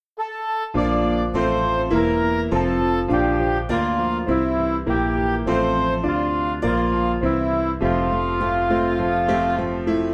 From Thee All Skill, Midi; Albano

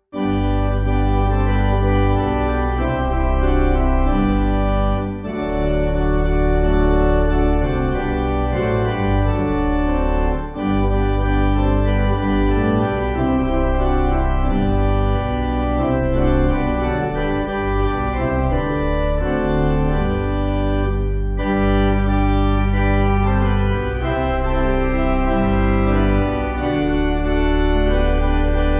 Pipe; Down In The Valley

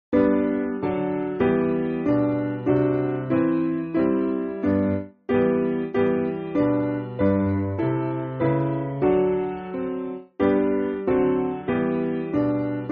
Piano; Jesus Tender Shepherd; Brocklesbury

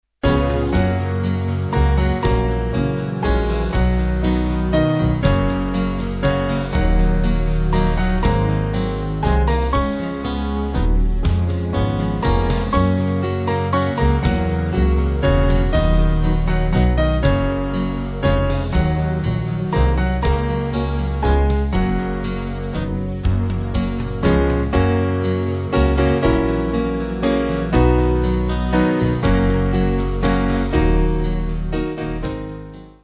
Band

